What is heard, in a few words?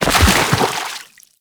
Roll Forward Foley Water C; Enemies; Warframe; Teshin; DE